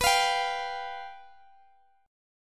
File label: F Suspended 2nd Flat 5th, chord